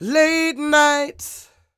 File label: SING W, Vocal Planet Blues & Soul, BLUESY; ILIO